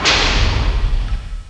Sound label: bash; duke nukem total meltdown